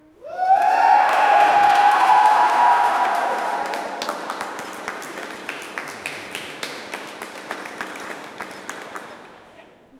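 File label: indoor swimming pool crowd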